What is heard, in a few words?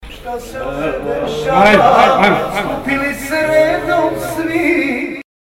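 Is Calling R&B, ringtones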